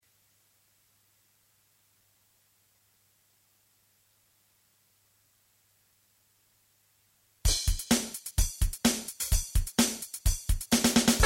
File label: rock strum